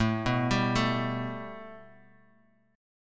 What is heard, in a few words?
A 7th Suspended 2nd Bass Standard; A7sus2 arpeggiated; JGuitar